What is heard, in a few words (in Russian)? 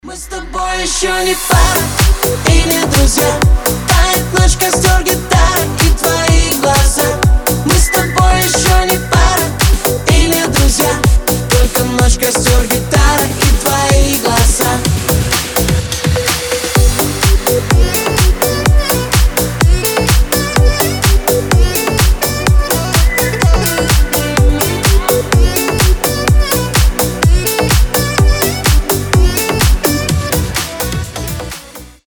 gitara